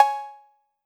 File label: 808 Cwbl, Roland MV-8008; Roland MV8800